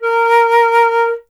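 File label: flute A#; Miroslav Vitous; Solo Instruments VOL-2; Solo 2 Bank 1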